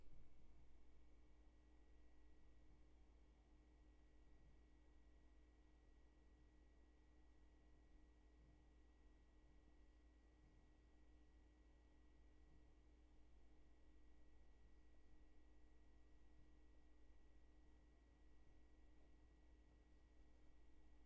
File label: roomtone